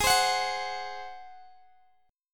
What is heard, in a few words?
Ab 7th Ab down Guitar Open G; strummed